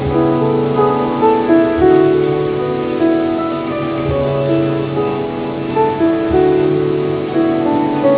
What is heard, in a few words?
musical